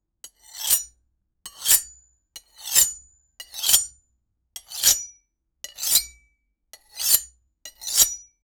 metal cutting with knife

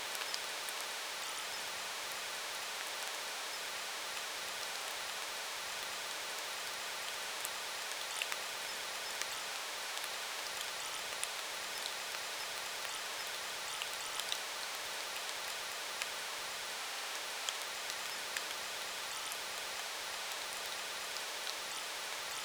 forest in light rain atmo

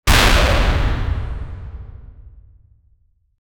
earthquake attack for a g; natural disaster

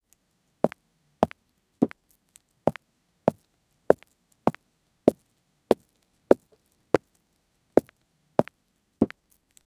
soft single footstep; shooter

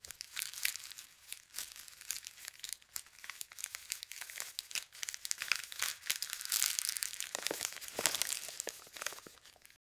gentle paper crackle

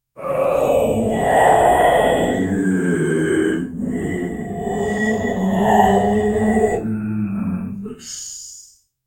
humanoid monster trying

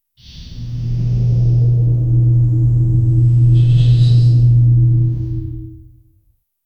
sudden transition to soft

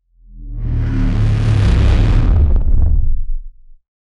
short sound effect with a, fireworks

